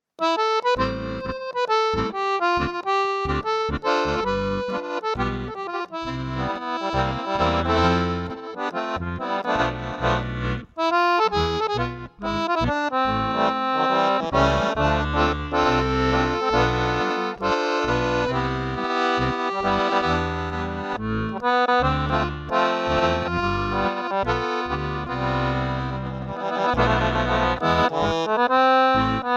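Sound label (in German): Jazz, Harmonika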